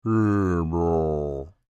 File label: Creature Speak Groan Med, Human; Groaning; BigRoomSound